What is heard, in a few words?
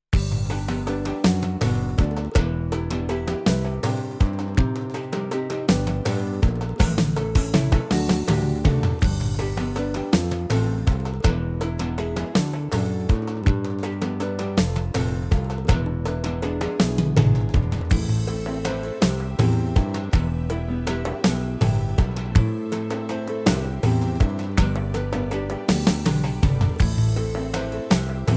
Yamaha PSR-A5000 Style Azari